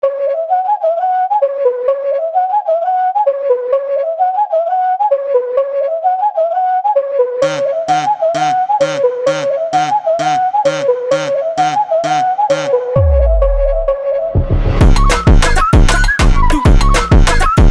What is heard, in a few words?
Funk Brazilian Phonk